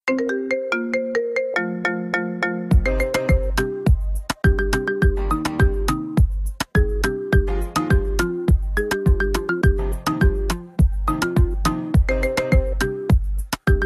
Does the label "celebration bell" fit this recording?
No